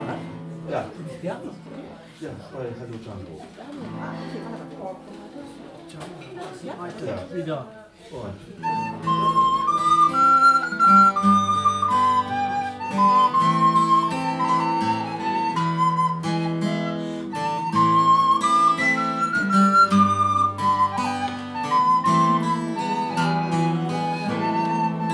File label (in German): Flöte & Gitarre